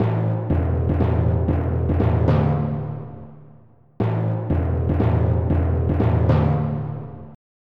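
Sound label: timpani; Roland - An Invitation To The Roland World Of Music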